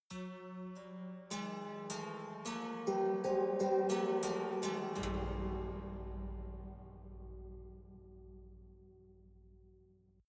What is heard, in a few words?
haunted strings chromatic sequence